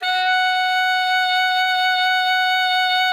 F#5, ALTOSAX; Xsample VOL-2; 90 s Sample CDs

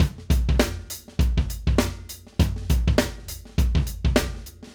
Acoustic Live Drum; Platinum Audiolab

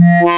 ppg mallet syn, wavetables

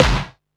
snare, techno 138 BPM

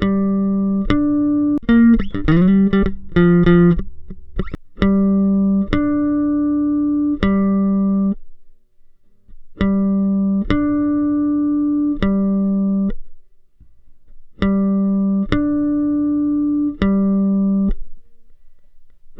COOL RK 100, Bass Grooves; USB Soundscan vol.30; AKAI

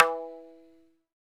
PRC TABLA

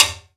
Timbale

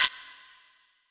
Guiro S, percussion reverb; MARKYDRUMS

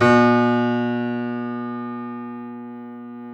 C7 F, The Ultimate Piano Collection; East West

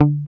GUI MUTE, E-GUI MUTED

